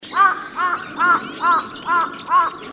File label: Large billed Crow